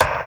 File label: process hit; Drums In Your FACE!